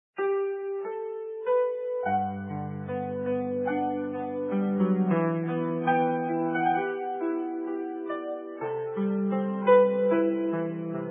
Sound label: piano